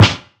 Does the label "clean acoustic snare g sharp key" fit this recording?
Yes